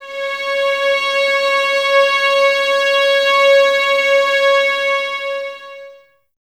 C#5, 11 Violins; Miroslav Vitous - String Ensembles

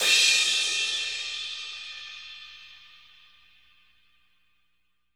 CRASH; 90s sample CD

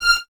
Violins Detache; 23 Violins; Miroslav Vitous - String Ensembles

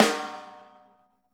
RAW SD, Drums Acoustic; USB Soundscan vol.10; AKAI; 90s sample CD; RAW KIT 1

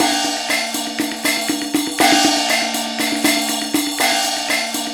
mix 120, percs 120bpm; INIS; Opium

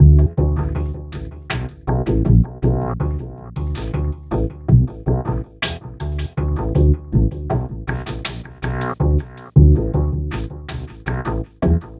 Remixed Acoustic Bass

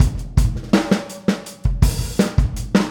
Boogaloo, Full Mix, 82 BPM; Drums; Verse; Sonic Foundry; Drums from the Big Room The Mixes

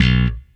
STEINBERGER; InVision Interactive; Lightware VOL-1 - Instruments & Percussions

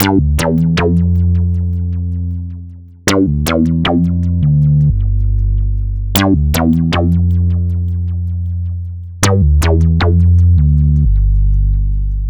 Synth Bass

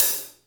HATS SEMI, Drum Kits

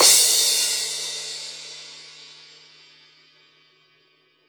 Drums Acoustic, RAW KIT; USB Soundscan; AKAI

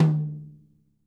mid tom

